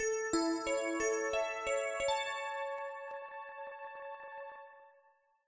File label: Chime